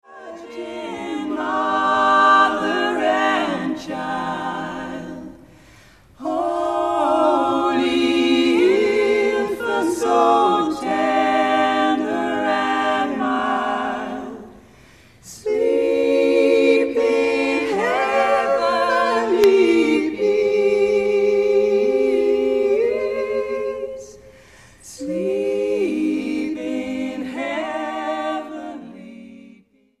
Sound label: silent night ssaa